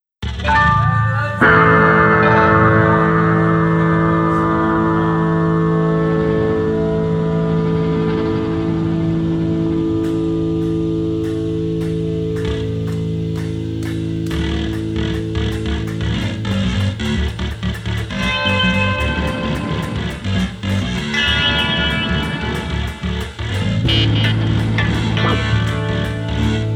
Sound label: screamin sirens